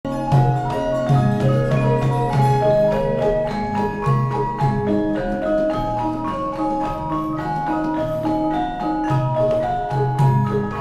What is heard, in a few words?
Javanese Gamelan; Serpegan Sanga; Indonesian